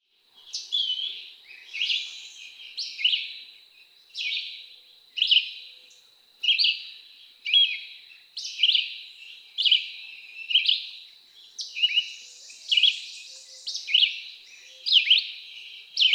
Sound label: Red-eyed Vireo